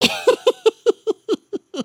just kidding, emotes

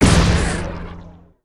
Energy Ball Fire; weapons; aronwk collection; ndaudio

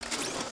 Fidget Arm; emotes; Overbuild

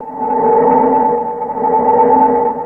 Pod, ambience spaceship

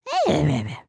Stunned; creatures organic; Lego; Strombie

